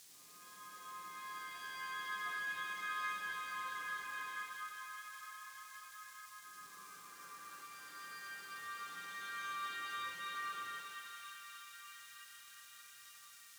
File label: Pad; Smol Pad